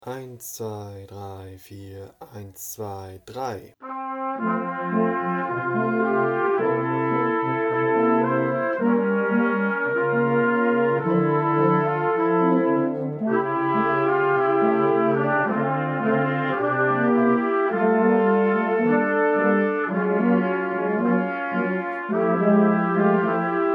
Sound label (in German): bossa mit Einzähler